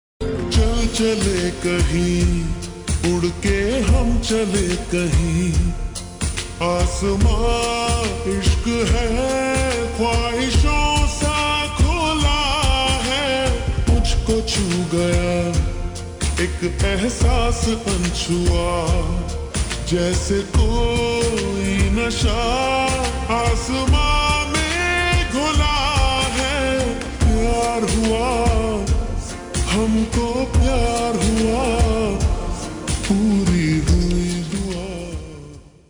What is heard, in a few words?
Ringtone slowed and reverb